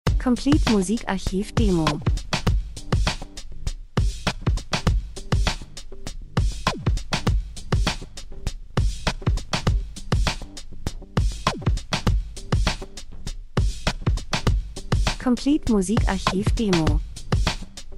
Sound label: drums; Elektro Pop Rhythmus Lifestyle Positive Stimmung Urban Club Abend Feiern R & B Industrie Dokumentation